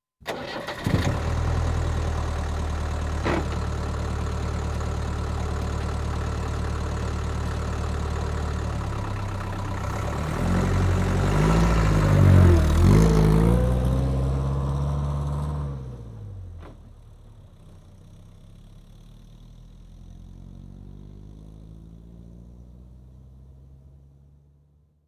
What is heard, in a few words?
Landrover Safari Diesel Engine Started Vehicle Departs